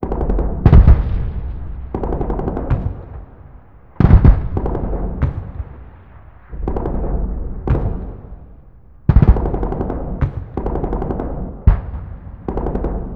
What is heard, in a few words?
aa gun firing dist